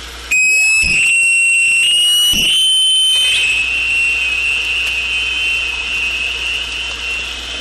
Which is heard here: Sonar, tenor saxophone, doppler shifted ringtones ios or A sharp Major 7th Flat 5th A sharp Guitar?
Sonar